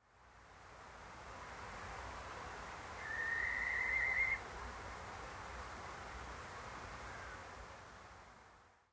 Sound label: barn owl nocmig